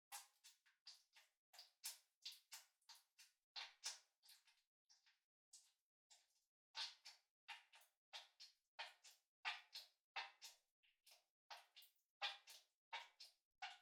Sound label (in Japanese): クチュ音 穴 泡立つ; 3DIO; アダルトな音